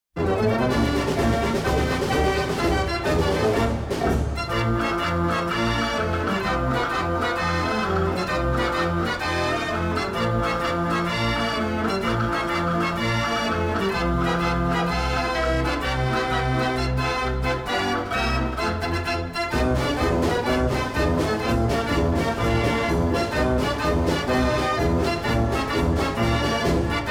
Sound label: holloderoh marsch